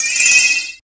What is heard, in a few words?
powerup; scummvm; The Griffon Legend